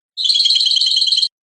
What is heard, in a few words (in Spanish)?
cascabeleo basto, campero